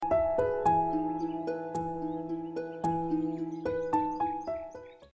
Tone

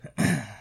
Grunt

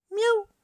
miau

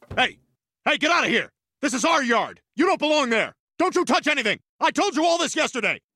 Yells At Squirrel

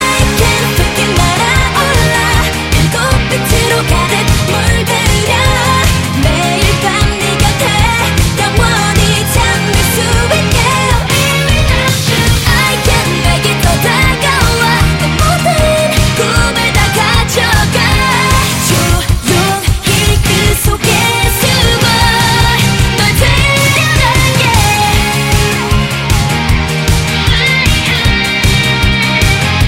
Chorus

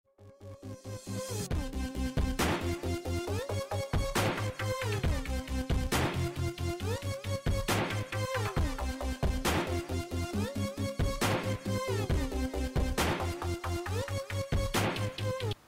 Cool Music

